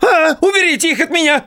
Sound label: Drums; Twitch Drums